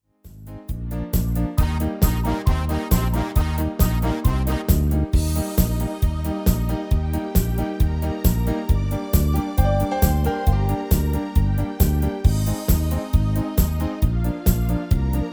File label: MIDI